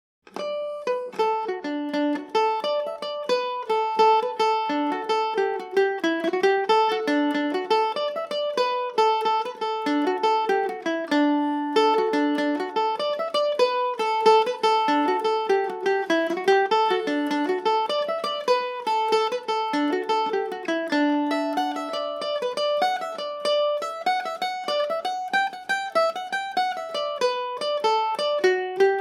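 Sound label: kitty lie over D major, irish mandolin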